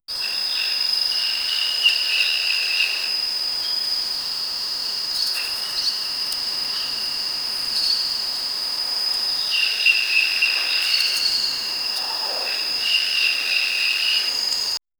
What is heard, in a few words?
a dense jungle at dawn